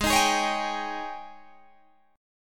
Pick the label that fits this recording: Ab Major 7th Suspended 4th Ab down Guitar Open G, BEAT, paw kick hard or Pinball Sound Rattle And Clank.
Ab Major 7th Suspended 4th Ab down Guitar Open G